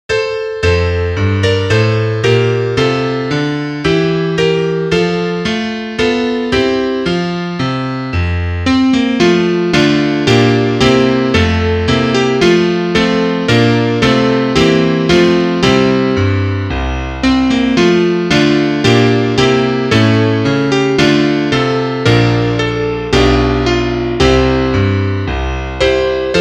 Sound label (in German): Klavier; wenn alle bruennlein fliessen